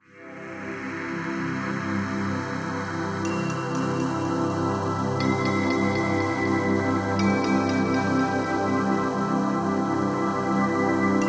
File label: Dungeoneering Floor Track; RuneScape 3